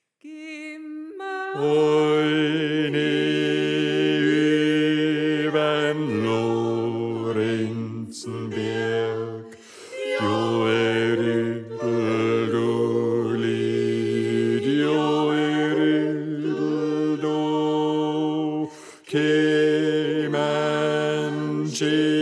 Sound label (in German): Bass; 63-Geh Ma Oine Übern Lorenznberg